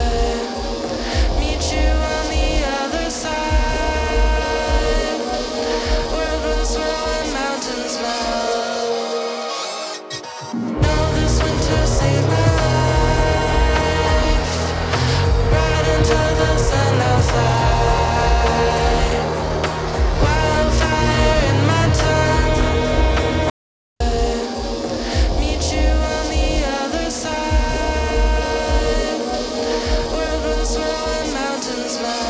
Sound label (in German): STEREO BUS eqing Beispiel Sättigung Band 1